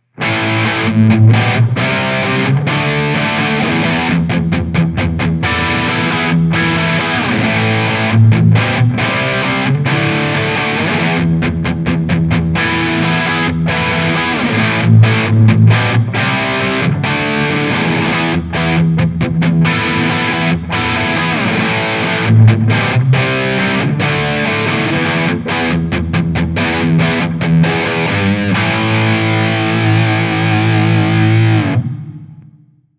Dyn 421 off axis Ribbon 160 off axis Room Subway 11dB